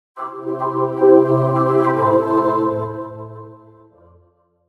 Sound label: Windows XP Startup Sound in Choir, Vocoder